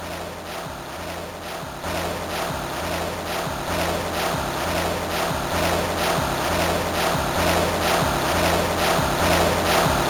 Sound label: champ (applause); SoundFX 1.3